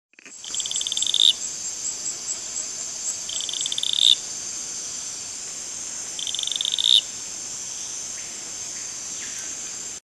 Atelopus hoogmoedi

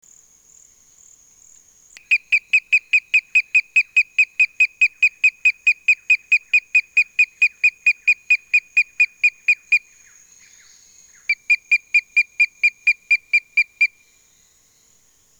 Rhinella magnussoni; CENBAM

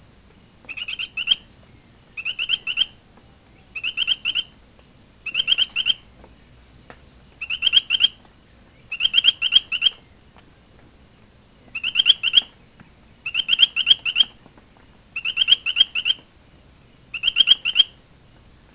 Allobates myersi

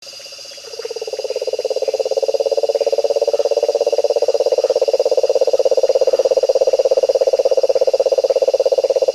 Bufo marinus

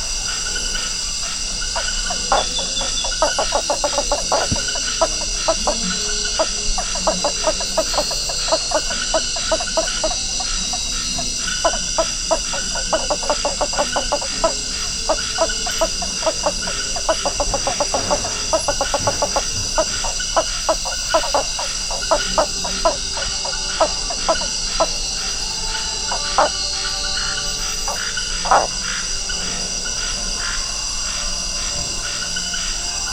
Hypsiboas geographicus

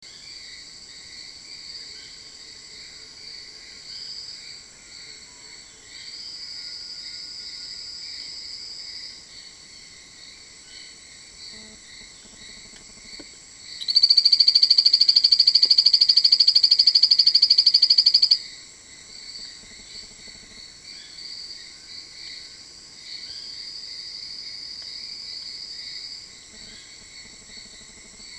A crombiei, Cachoeira do espelho, 25.4C

